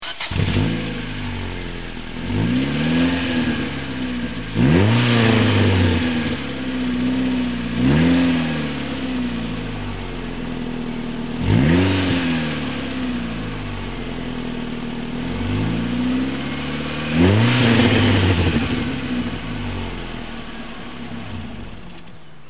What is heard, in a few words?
dual exhaust